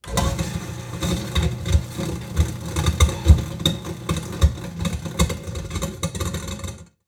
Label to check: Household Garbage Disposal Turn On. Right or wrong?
Right